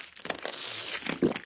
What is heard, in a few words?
Drink swallow